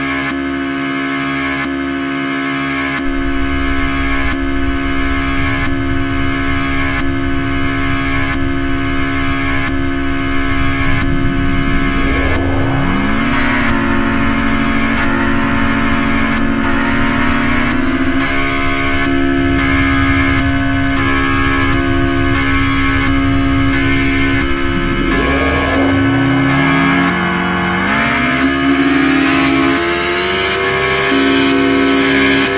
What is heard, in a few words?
paper plane jig